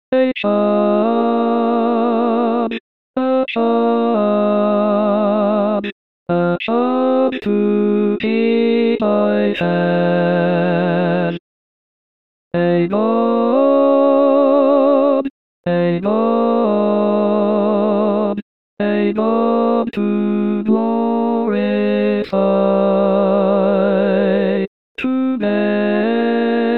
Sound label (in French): Hymne variations